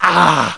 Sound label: pain; Alien Arena; hunter